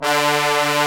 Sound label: BRS TBNS, Bone Sec ff; Roland L-CDX-03 Disk 2; Bone Sec.FX